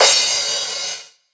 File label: Crash, Cymbals